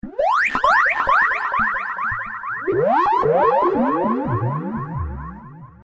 lovely message

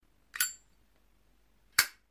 Zippo

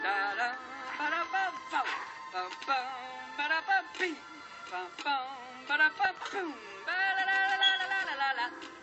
Scatting

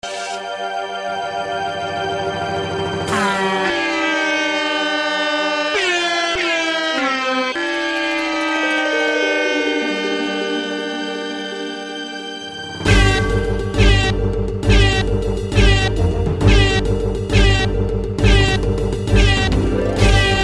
Airhorn; Runescape

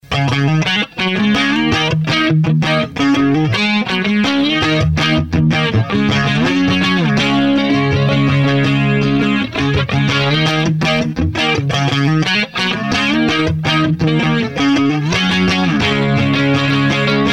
Danger ringtone

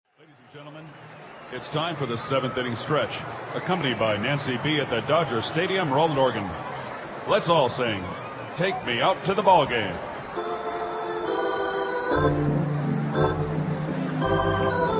take me out to the ballgame, stadium organ; dodger stadium organ

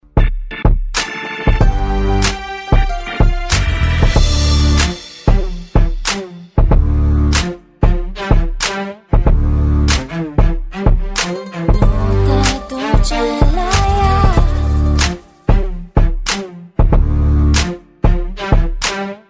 great violin; classical

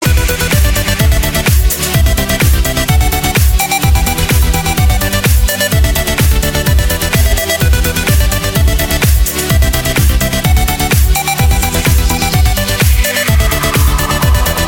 Electro Beat

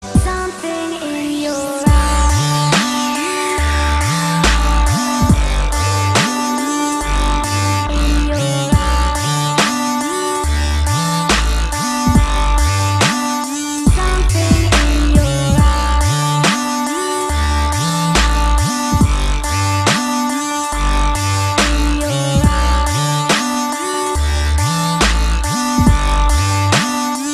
dubstep, ringtones; electronic

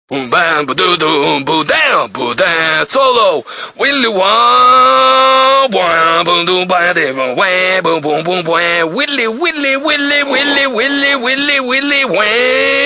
playing guitar

